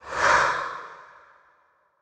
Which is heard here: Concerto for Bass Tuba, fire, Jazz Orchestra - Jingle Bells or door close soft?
fire